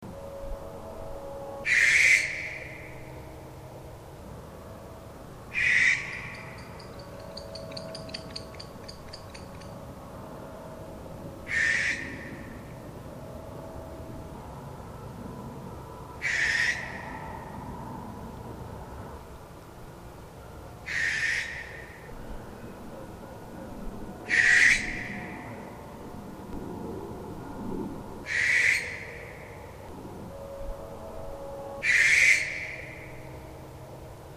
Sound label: Raspy shrieks